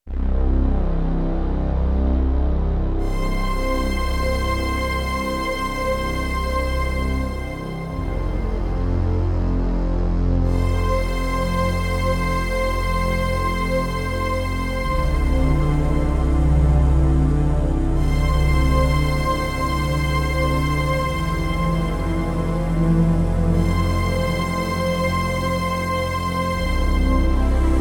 Killer Pad, Roland JD-800; Factory Patches

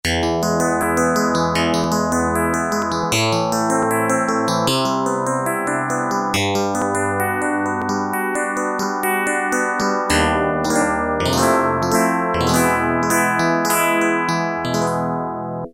Guitar, Yamaha TX81Z

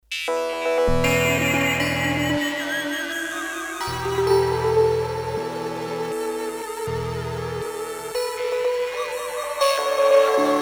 Key Split, M-Audio Venom

